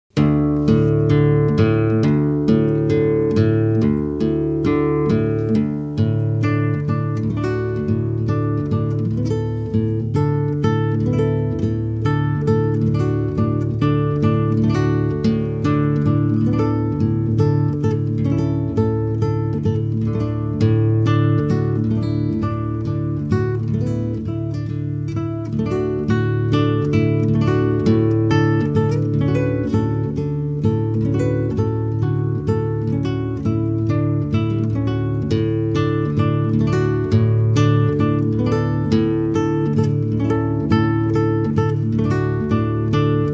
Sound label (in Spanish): Guitarra